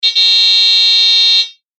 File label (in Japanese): クラクション重音ププー